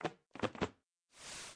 Dropping Notebooks; Learn to Fly 2

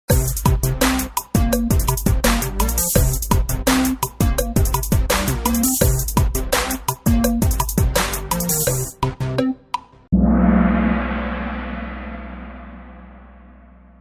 In Game Music (10 Second Count Down); Kahoot

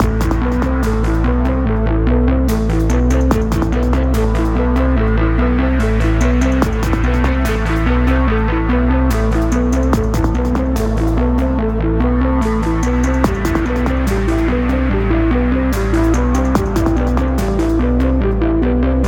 Music - Space Station Loop; Smashy Road Arena android ios 2016

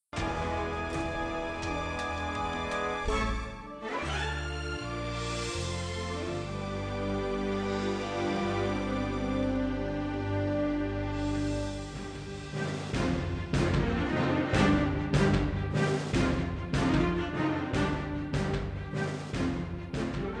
Music Idle; Zak Storm Super Pirate; mobile

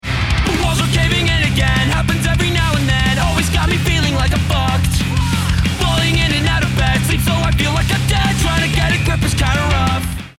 lost round

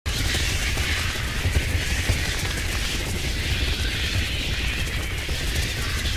Bats Attack

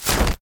Umbrella Open; bad piggies; android; mobile; windows

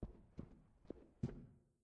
power out step; Five Nights at Treasure Island; windows

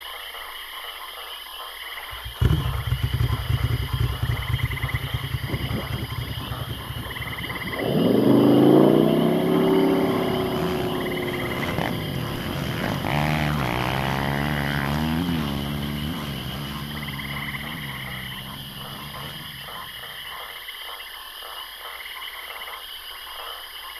Region Background; Glorpcorp; Calling All Mixels; 2014 mobile gamerip

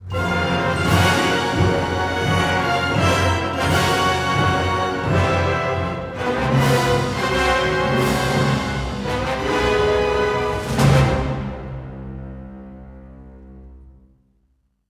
Outro; Hero Han Solo

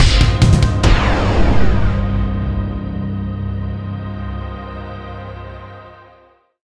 Mini Boss Outro Win; Insanely Twisted Shadow Planet

